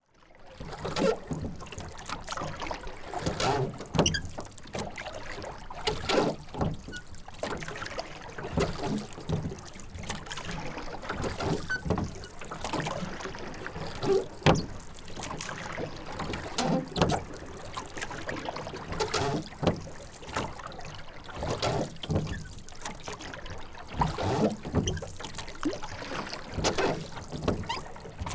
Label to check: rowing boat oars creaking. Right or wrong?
Right